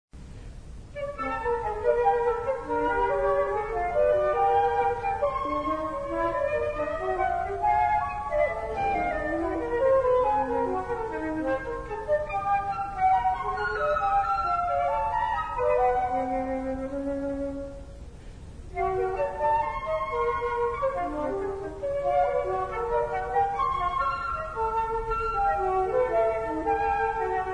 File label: pieces flutes